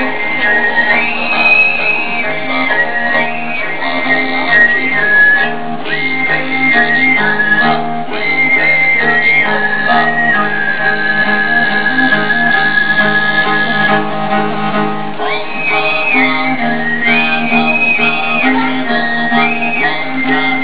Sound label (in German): Chinesich Musik; Salzburg; Erasmus